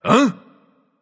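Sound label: nonverbal surprised; smite; voicepack; merlin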